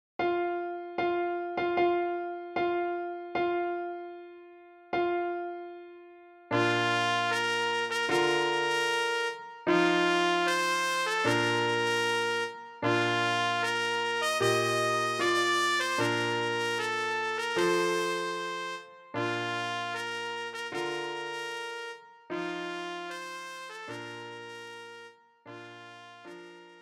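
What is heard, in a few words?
trumpet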